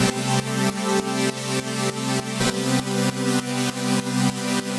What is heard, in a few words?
Pad dbx 100 C, sidechained